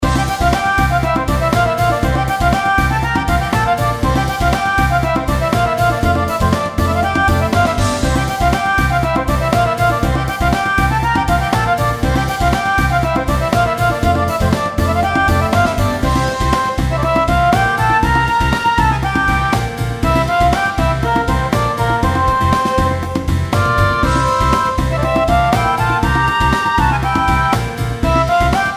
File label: bgm